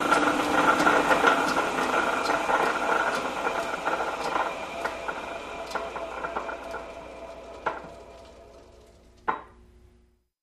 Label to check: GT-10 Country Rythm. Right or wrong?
Wrong